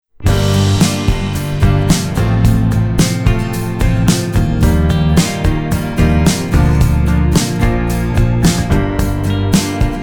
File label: INSTRUMENTAL